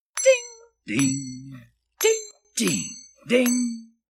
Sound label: ding ding ringtone; drifter